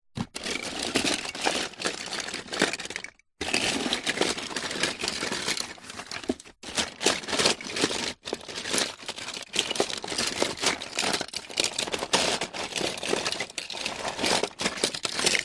leisure toys small metal cars rummage cardboard box